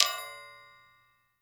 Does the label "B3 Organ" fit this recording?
No